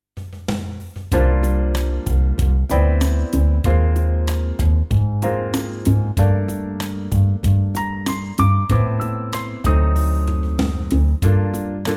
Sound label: F no saxes